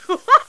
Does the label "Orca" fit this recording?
No